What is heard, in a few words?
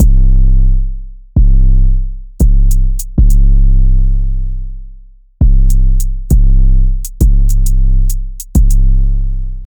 trap drums with 808 bass